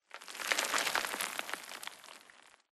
Rock Debris Small Short; Boomtown; Titanfall 2

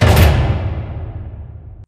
sliding door lg verb open start; beacon; Titanfall 2

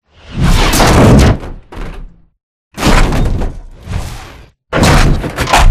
flyer dropship attack close; boneyard; Titanfall 2

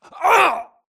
grunt death quick; Titanfall 2

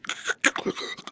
spectre chest punch grunt; Titanfall 2

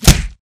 bullet light ballistic flesh; Titanfall 2

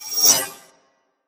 HUD Center Screen Announcement; Titanfall 2

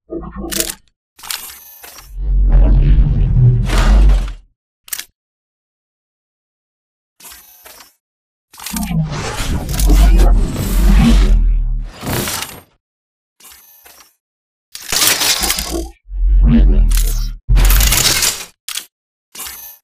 Casual Idle; Titanfall 2

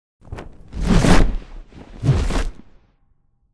flyer perched take off attacked close; boneyard; Titanfall 2

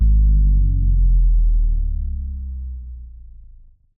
Giant Floor Panel Lower Rotate LFE; Boomtown; Sideways Town; Titanfall 2

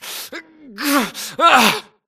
grunt Struggle; Titanfall 2

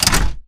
Mvmt FS Walk Default; AI Stalker; Titanfall 2

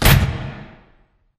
sliding doors open start; beacon; Titanfall 2

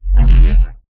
Movement Jog; Titanfall 2

